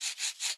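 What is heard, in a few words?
Special Photo Crayon Environment Draw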